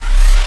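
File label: turret cannon power up; allegiance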